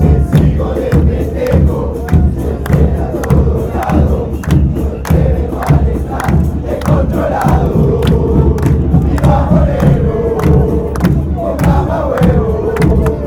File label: patronato chant